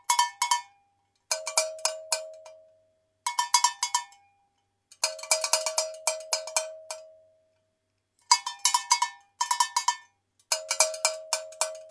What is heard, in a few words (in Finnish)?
lehmakello